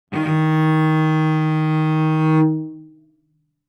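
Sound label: Cello arco fortissimo sul D Eb4; MIS Pitches - 2014; Strings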